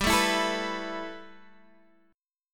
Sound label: E Suspended 4th G; strummed; Esus4/G